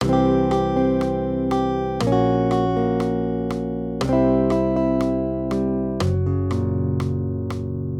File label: maj triads; C-Gb; accompaniment